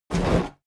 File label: large wood pickup; berufe; foerster